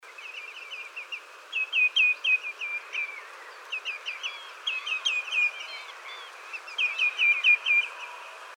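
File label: common greenshank, flight call; doelln-see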